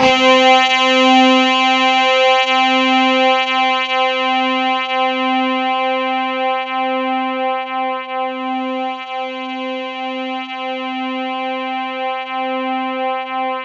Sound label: C4, synth; 90 s Sample CDs; NiceBeats; SynthLine VOL-27